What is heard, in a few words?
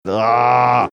ahhhgg